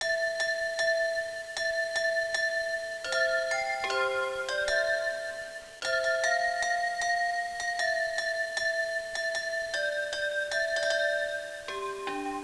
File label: music; cstrike